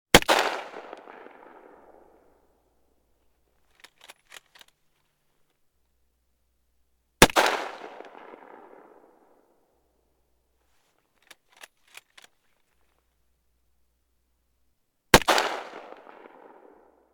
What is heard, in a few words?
gun mosin nagant; still north media